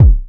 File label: BD, Drums Dance & Groove; USB Soundscan vol.11; AKAI; ALL BD